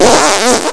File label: pain; quake2